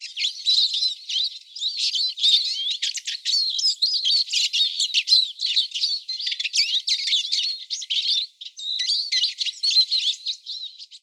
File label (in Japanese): mahiwa